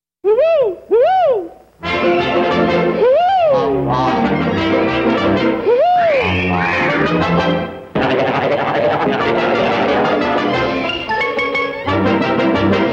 ahh whoo ah whoo long

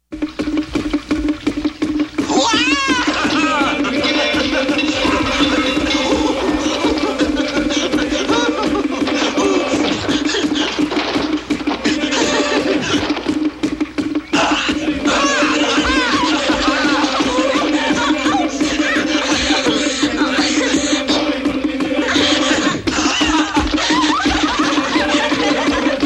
Drums whoops laughing

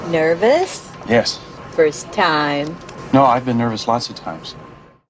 NERVOUS

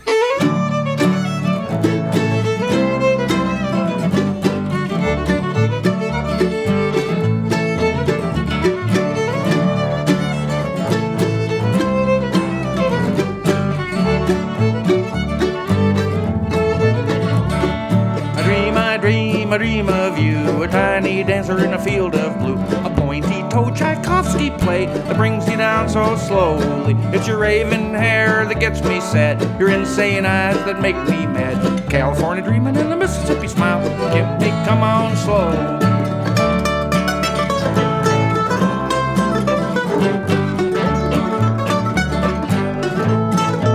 Coo Coos Nest Key D

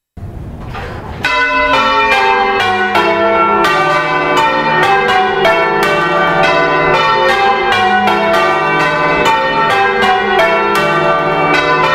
bells

